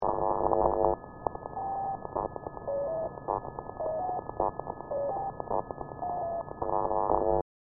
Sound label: gnupg many keys downshifted